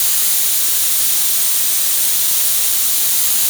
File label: pipe steam; WAR